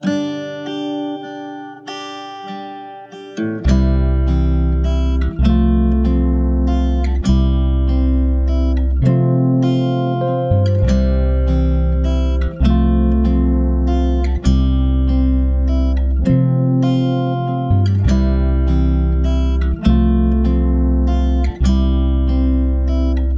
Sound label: Lullaby ballad brief intro